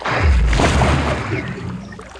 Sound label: big pump